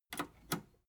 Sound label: metal button robust click slow